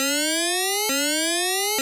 EMERGENCY, FM SYNTH; AKAI; 1000 Sound Effects

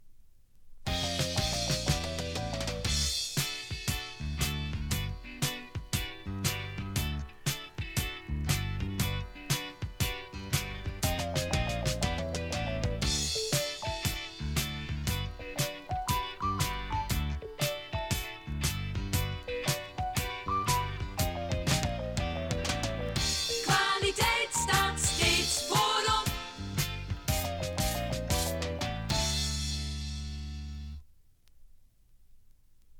topformat maxi jingle single

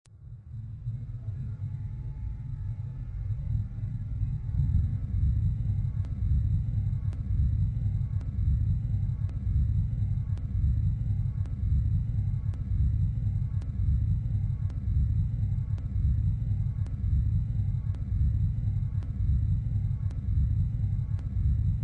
space ship humming; motoren

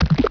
SOGGY, Water

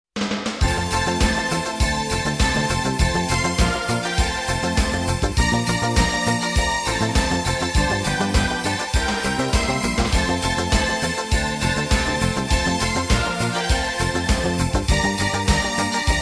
Jingle Bells; Focus Music

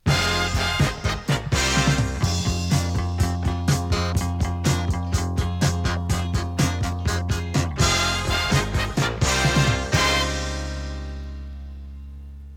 topformat maxi jingle single

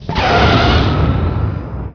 pod thrusters

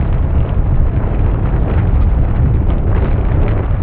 earthquake loop

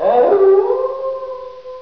HOWL, Dieren; sound effect

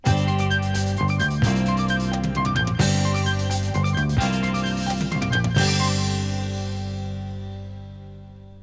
topformat maxi jingle single

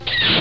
rebel blaster; Star Wars

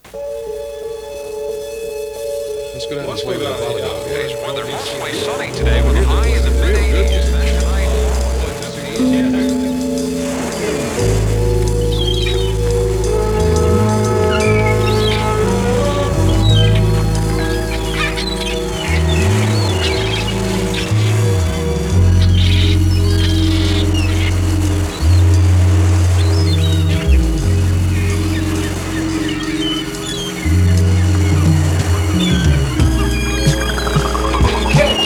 sun Shine Jingle; SunshineJingles

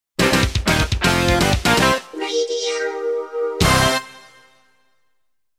Station Call; Sounders, Bumpers, CUES & IDENTS; DennisMusicLibrary

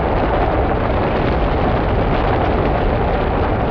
surface stone loop

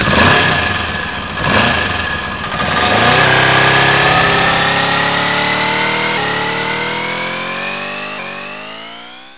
BIKE, motoren

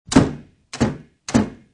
door slams; sound effect; Deuren

